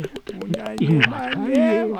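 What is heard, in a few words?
Music Village Calm Inside; from dust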